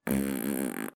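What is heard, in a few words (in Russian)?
звуки пук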